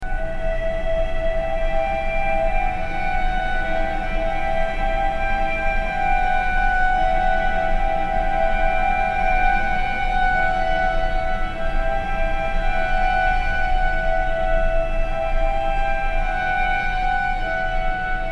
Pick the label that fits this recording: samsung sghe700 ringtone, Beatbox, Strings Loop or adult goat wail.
Strings Loop